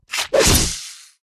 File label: bug attack middle